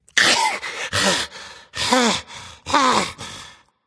breath; cupid

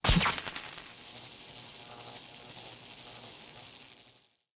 idle electricity; smite; pele

